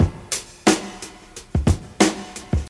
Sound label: 119 bpm drum groove f key, kick tuned to the F note